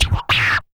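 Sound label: SHORT PERC, MALE PERC; Spectrasonics; Vocal Planet; Jazz FX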